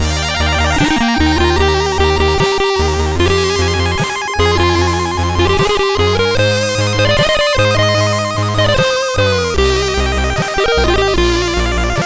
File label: sid CSG 8580R5; Thoerndahl Jesper